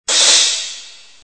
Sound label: cymbal